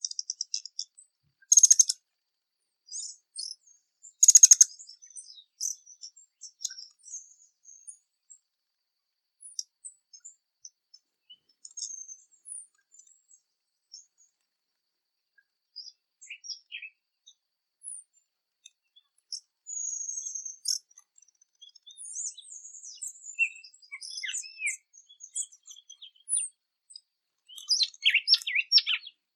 Canto do Beija flor de papo branco

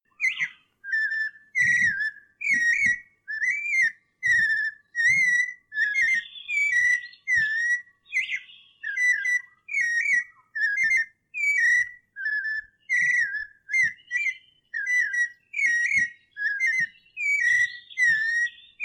Canto de sabia coleira

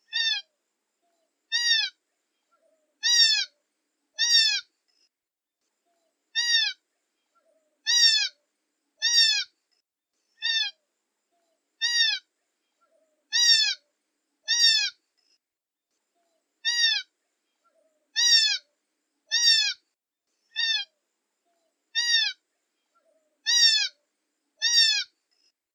Canto do diamante bichenov

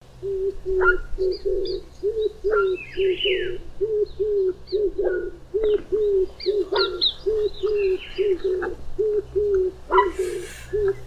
Canto da rola oriental

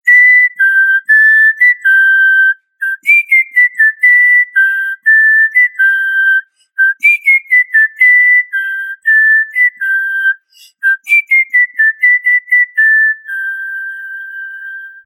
Canto de Calopsita

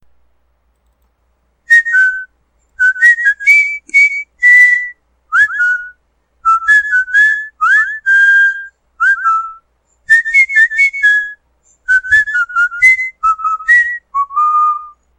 Canto de Calopsita

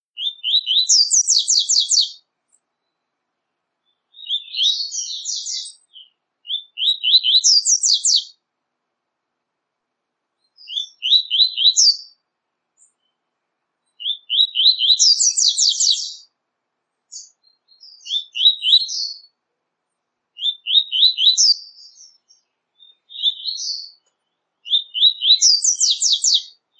Canto de Coleiro Tui Tui Rapido

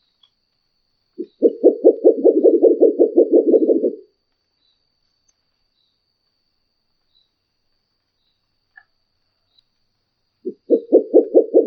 Canto da Coruja Murucutu

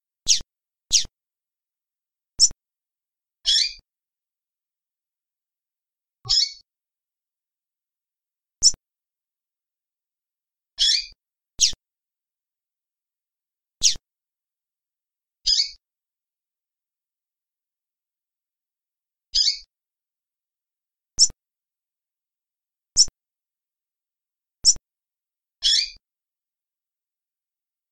Macheado de Femea de Coleiro

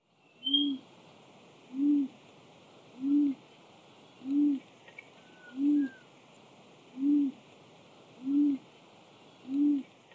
Canto da pomba de coleira branca

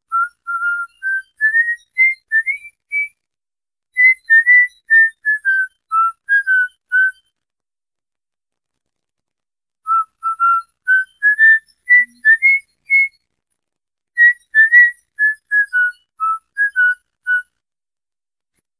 Assobio para calopsita

